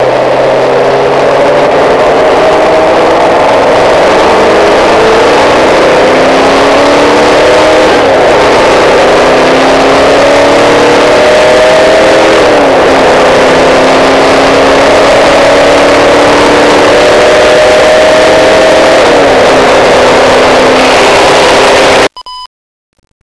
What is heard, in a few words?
corvette